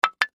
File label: Error Tone Chime Wood Block Multimedia; Web Interactive; Chimes; Blastwave